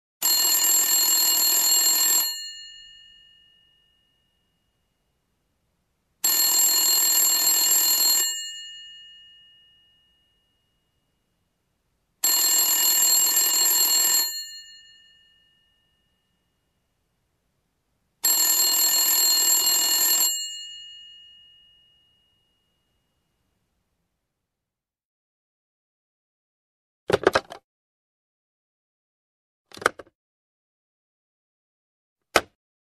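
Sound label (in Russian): звук звонка старого телефона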